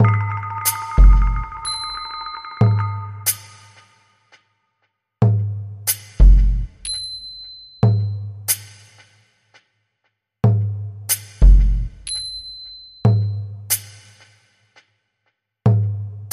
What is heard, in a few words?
Alt Drums Only